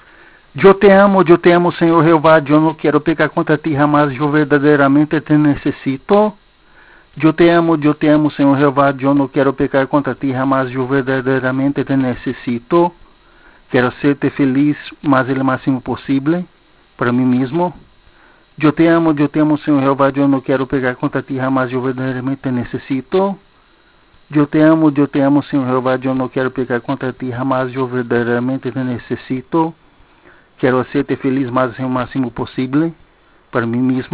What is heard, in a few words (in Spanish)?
con solamente voz